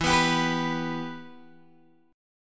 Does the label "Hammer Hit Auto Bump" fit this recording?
No